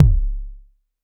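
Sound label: vermona drm1 mk3 bass drum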